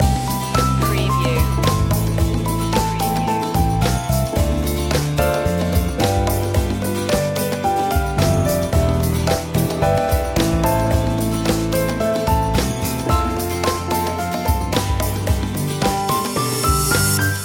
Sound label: jazzy loop; media music now